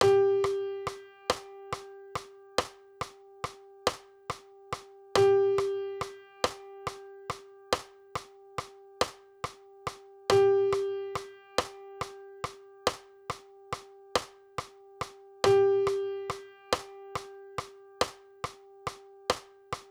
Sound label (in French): ronde pointee ternaire